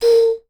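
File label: JAPANESE BA, BAMBOO FLUTE; Voice Crystal - Steve Reid Definitive Percussion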